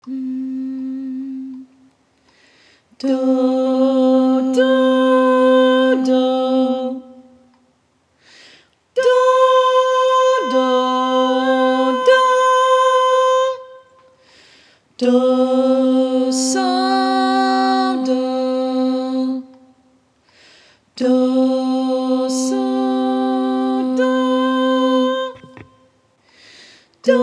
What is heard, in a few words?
Intonation in C both parts